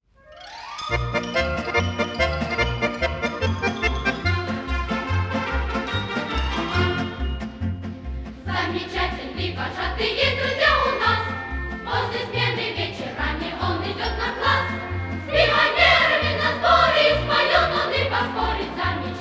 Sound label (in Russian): detskiy hor